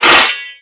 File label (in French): piques